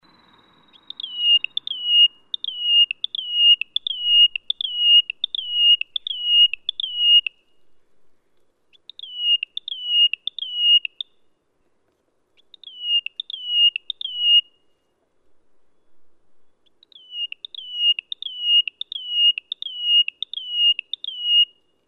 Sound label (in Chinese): 台灣叢樹鶯; 台灣短翅鶯、褐色叢樹鶯; 大尾鶯科/留鳥